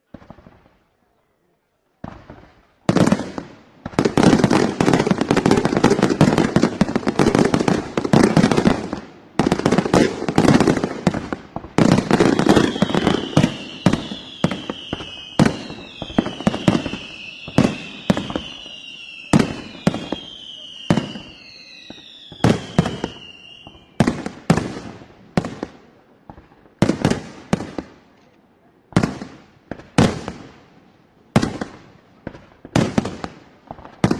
4th of july fireworks

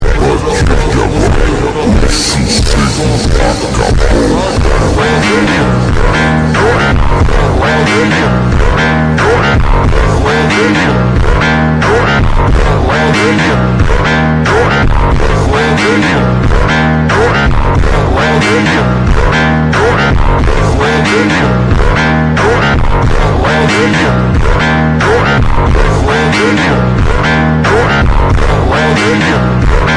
phonk

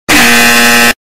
extremly loud buzzer sound effect; made with Voicemod

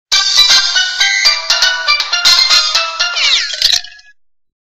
andriod ring tone

